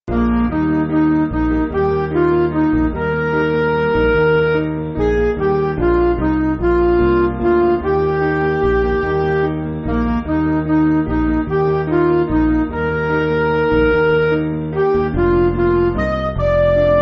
Midi

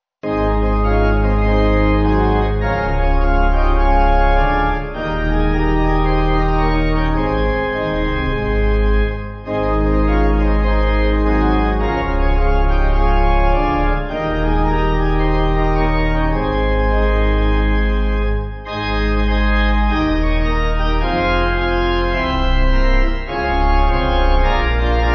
Pipe

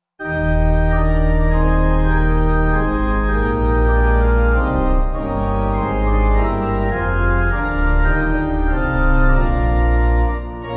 Pipe Organ, Music Backing Files for Churches

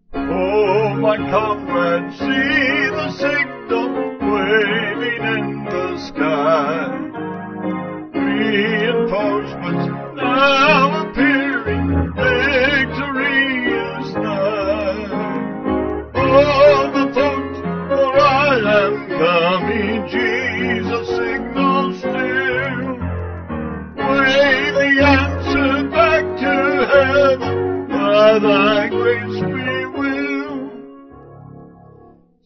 Vocals Piano

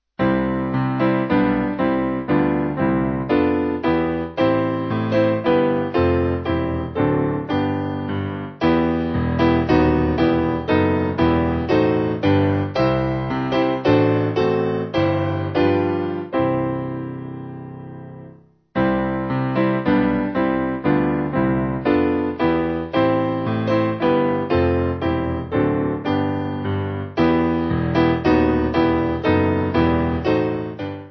piano; St Andrew

